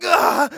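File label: pain sharp, German; Scout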